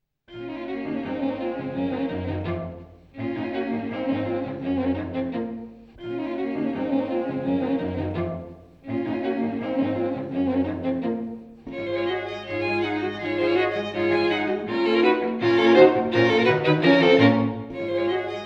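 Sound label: String Quartet; Beethoven; Hungarian Quartet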